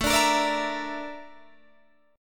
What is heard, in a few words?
A sharp Major Sharp 11th, A